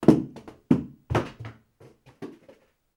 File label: impact shoe falling internal stairs; sound effects one